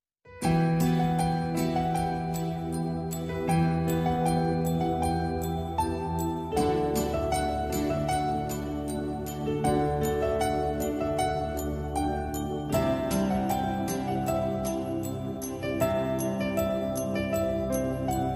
ringtone instrumental piano bgm

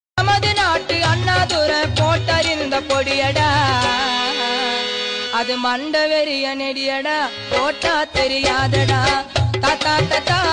love tamil song

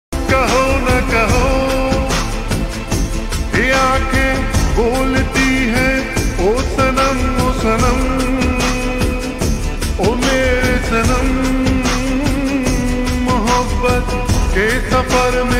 lofi slowed reverb

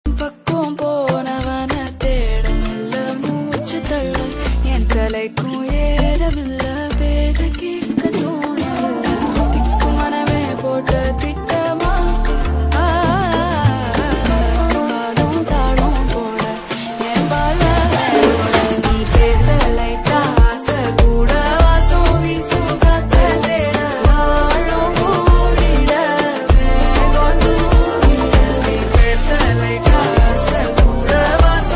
song bgm ringtone tamil song bgm

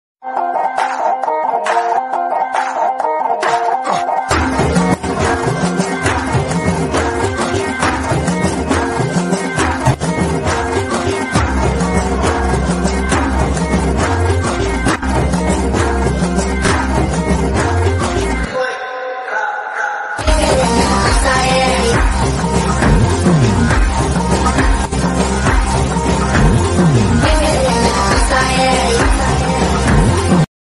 movie bgm tamil bgm; Prince movie

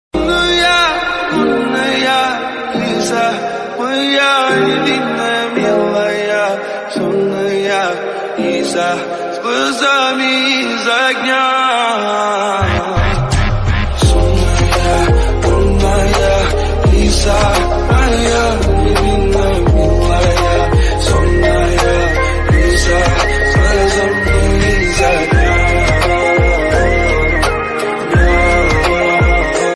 ringtone english bgm

